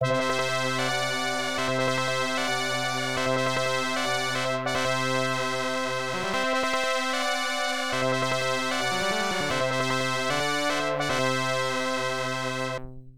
Victory; Zeliard; ibm pc at; opl

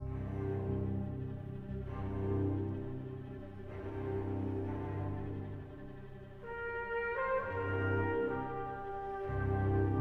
No Vocals; Assassin's Creed Syndicate

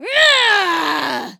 voice female battle shout

